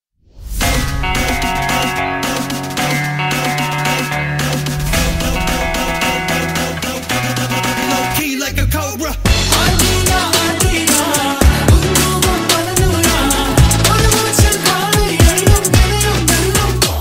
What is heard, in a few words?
song bgm ringtone; cobra movie; chiyaan vikram